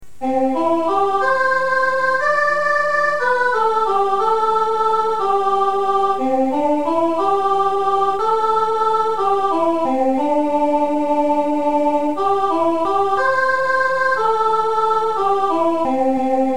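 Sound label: morning soprano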